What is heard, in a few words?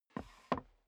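step boots wood creak scuff, footsteps; schritte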